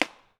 spyder no clip dry, guns; spyder se no clip edited dry no vol change

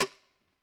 step fire, guns

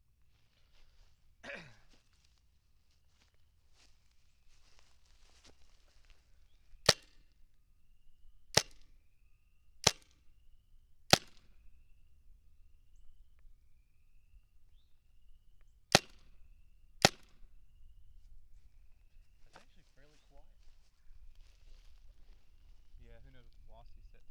vm-68 3 shots 3 dry short barrel, guns

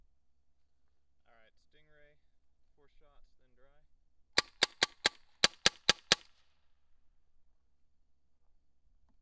stingray raw no clip, guns

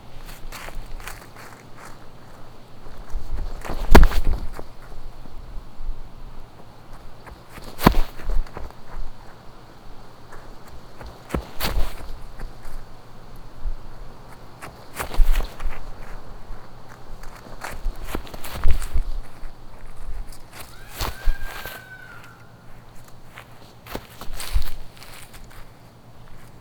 footsteps grass

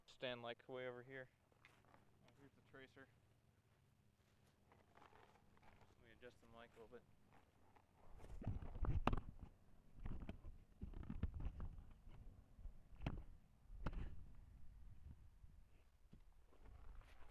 trracer shotgun mono; trracer shotgun less clip mono; guns

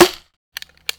pgp, guns

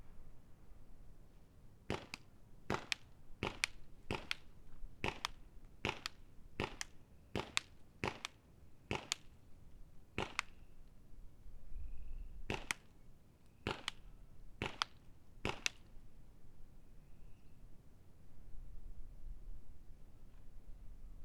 splats tree long barrel

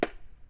splat metal thin dirt; splats; splat metal thin dirt clip

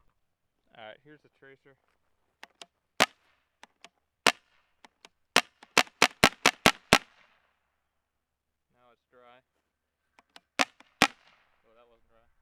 trracer shotgun clip, guns

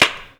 spyder, guns; spyder se no clip edited vol change

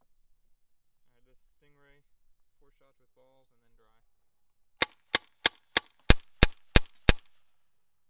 stingray raw slight clip, guns

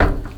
step metal, footsteps

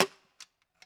empty step, guns

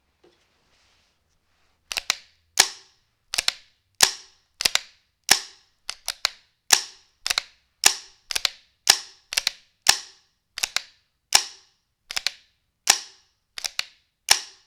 vm-68 cock short barrel; guns

